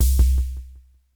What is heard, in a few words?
PERCUSSN, AMBNT, 160; Ambient; Drum